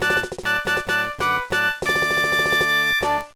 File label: Jingle; Doraemon Dokodemo Walker; Game Boy Advance